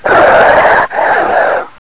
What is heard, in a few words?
pain